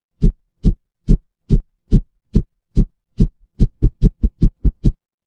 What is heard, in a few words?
mechanical engine